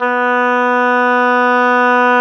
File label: oboe, Roland Orchestral Winds; Oboe Brth; Oboe 1